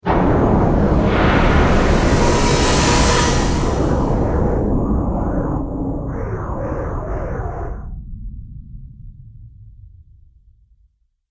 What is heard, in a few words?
Trailer Hit Dark Portal; Trailer Sound Effects